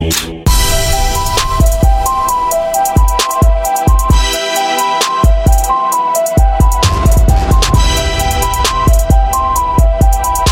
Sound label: Trap beat